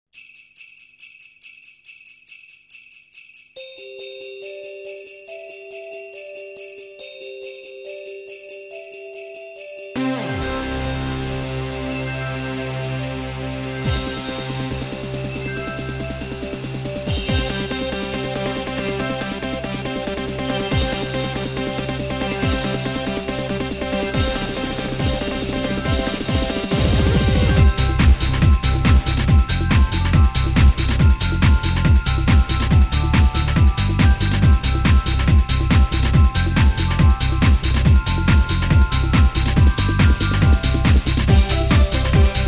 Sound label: Jingle Bells Techno